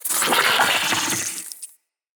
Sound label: root spider vocal attack; Animals; Spiders; Vocals